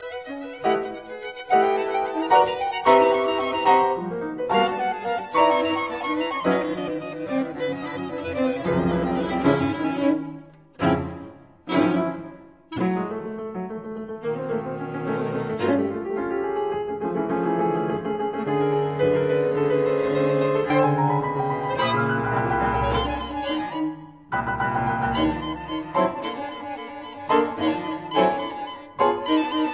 Quartet for Clarinet Violin Cello and Piano Finale Energico